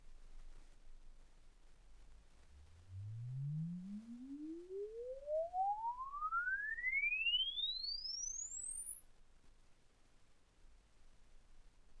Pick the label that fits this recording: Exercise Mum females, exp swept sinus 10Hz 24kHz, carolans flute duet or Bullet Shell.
exp swept sinus 10Hz 24kHz